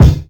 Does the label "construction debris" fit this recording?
No